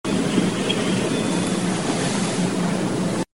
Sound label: possible doorbell